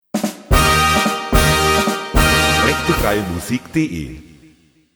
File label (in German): Tusch ta ta ta